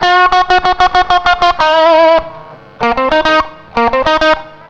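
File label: distorted guitar; USB Soundscan vol.31; Miro s Grooves; AKAI; DISTO GTR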